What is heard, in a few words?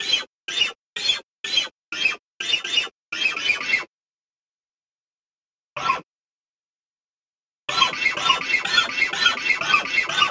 fret noise